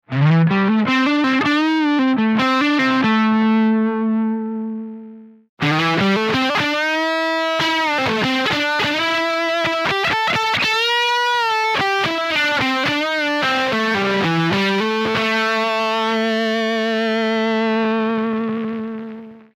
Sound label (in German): Fuzz vor Amp